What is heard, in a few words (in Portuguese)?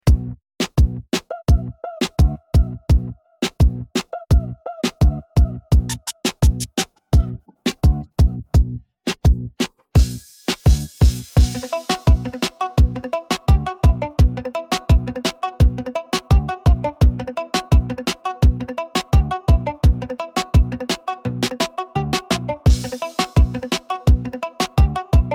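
base brega funk estilo gordinho bolado instrumental brega favelinha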